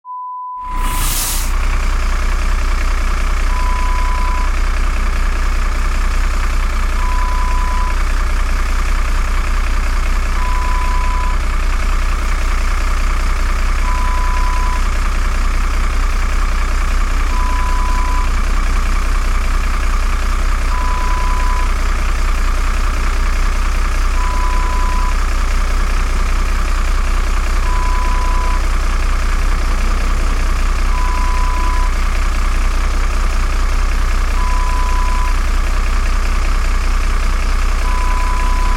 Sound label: Bus engine